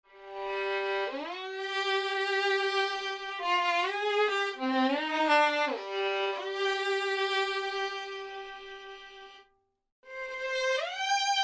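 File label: Solo Vln test, Violin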